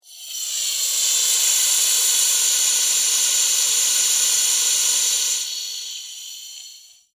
Pneumatic Release, Scifi; Air, Valve, Science, Fiction; Spacecraft; BlastWave

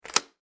Foley Deadbolt Unlock Door; Locks; Objects; SFX Bible

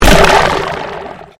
Convolution Pulse

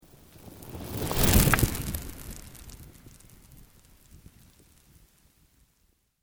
Sweep Organic Slow

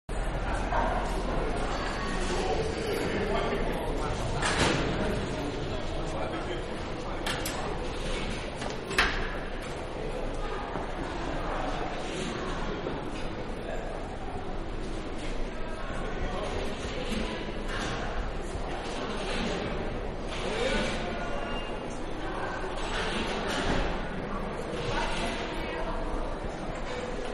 Subway-Station-Ambience, Exterior; Subways (Sound Effects:Vehicles); Manhattan Production Music

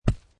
Snow And Ice Snow Ball Impact; Ice (Sound Effects:Weather)

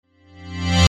CHIME REVERSE; Chimes; Blastwave

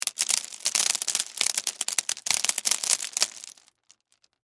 Crash Stones Debris, Crash, Stones, Debris, Spill; Rocks; Materials; BlastWave

